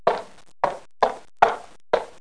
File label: fall walk; My Science Fair